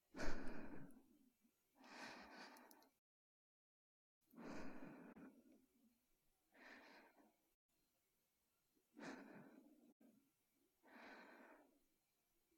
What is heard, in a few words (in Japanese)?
寝息ループ 囁き重視, ASMR; 効果音あり